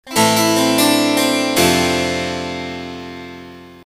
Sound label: harpsi